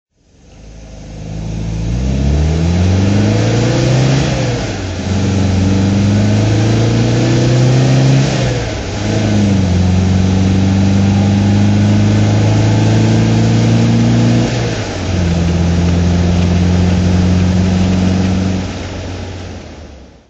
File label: mg mgb roadster drive